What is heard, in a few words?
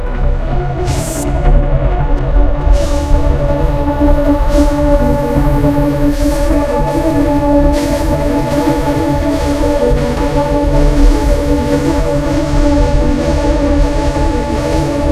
Happy synthwave music played by a science fiction instrument in space; audiogen